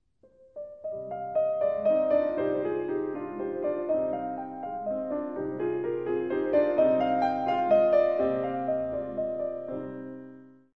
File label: berceuse, piano 4 mains